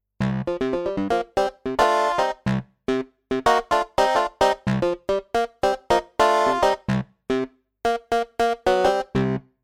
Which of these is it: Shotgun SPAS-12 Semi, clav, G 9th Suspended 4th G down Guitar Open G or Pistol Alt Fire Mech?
clav